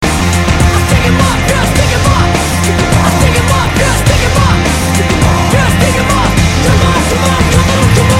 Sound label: win, music